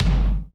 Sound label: kick